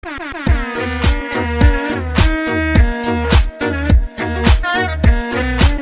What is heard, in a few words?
deep house mix